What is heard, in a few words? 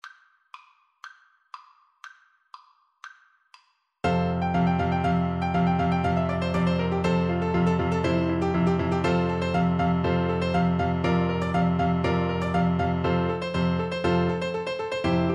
Capriccio Espagnol, Clarinet